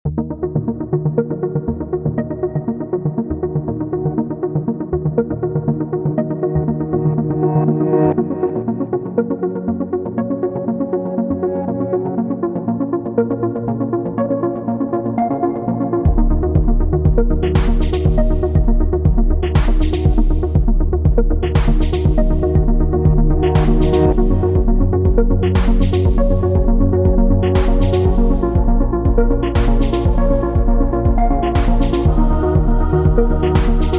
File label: Organic Synths